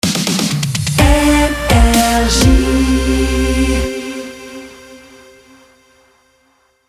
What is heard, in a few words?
Jingle Rapid Fire; NRJ